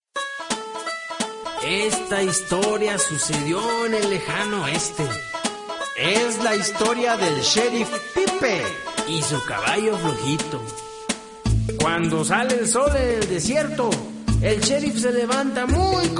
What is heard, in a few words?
pipe